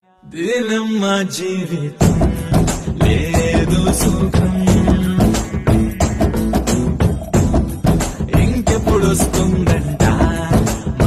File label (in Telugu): sad song telugu